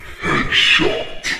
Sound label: Headshot